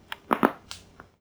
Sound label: Neck click